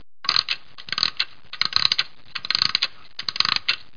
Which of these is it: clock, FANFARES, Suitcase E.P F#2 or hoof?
clock